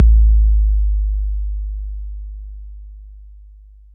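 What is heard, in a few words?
bass drum 808 Smooth C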